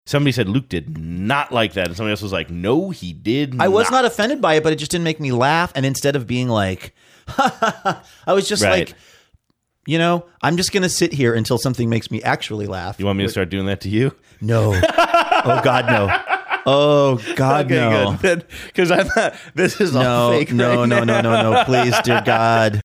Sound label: unfunny forced laughter; does not want